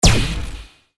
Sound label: interstellar gun